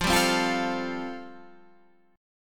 A-Minor 7th Sharp 5th F

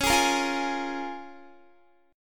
Db 6th Db, down, Guitar; strummed

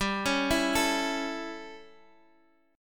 A Major G; A/G Chord {X X 5 6 5 5} arpeggiated

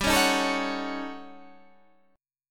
D sharp 7th Sharp 9th Flat 5th

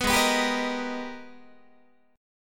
Minor Major 9th, Guitar; G#mM9 strummed; 14,16,14,17,14,14; 4A,4E,5A,5D,5G#,6C#

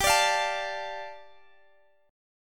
G 7th Suspended 2nd, Guitar Standard; x,x,17,19,18,17; strummed; capo=3

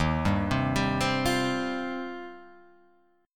Eb 6th Add 9th, chord; Eb6add9 arpeggiated; x,x,1,0,0,2,2,2; JGuitar

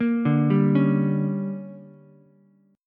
D Major 7th Suspended 4th Sharp 5th, Ukulele; DM7sus4#5; arpeggiated; JGuitar

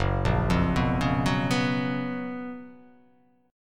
Gb Major 11th; GbM11 arpeggiated; JGuitar

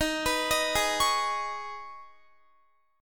Eb 7th Suspended 4th Sharp 5th, Guitar; Eb7sus4#5; arpeggiated

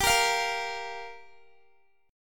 G Minor 7th, Guitar; Gm7; strummed

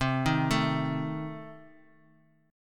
C Minor 6th, chord; Cm6 arpeggiated; JGuitar

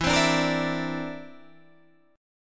D 7th Suspended 2nd Sharp 5th E

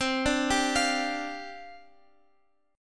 C Suspended 2nd Suspended 4th C Guitar Open F; arpeggiated; Csus2sus4; x,x,12,9,10,12

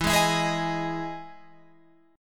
E Major 7th E down Guitar Open F; EM7 strummed

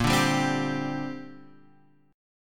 C 7th Suspended 2nd Bb

